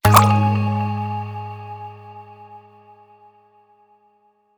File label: Cave Bounce